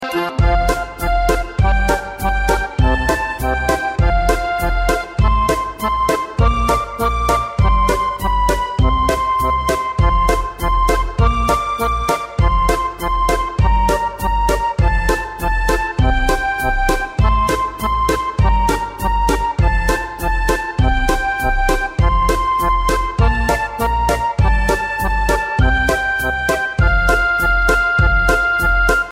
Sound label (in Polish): dzwonek